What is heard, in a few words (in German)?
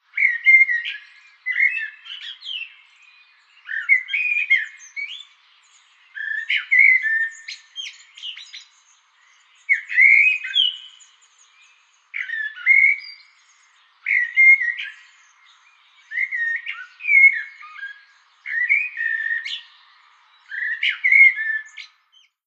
amsel